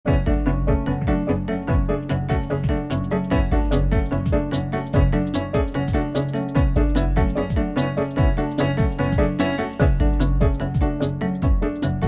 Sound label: Minimal Ad, MINIMAL